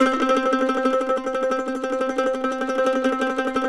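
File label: mandolin tremolo C4; Sampleheads; Whole Lotta Country 1 & 2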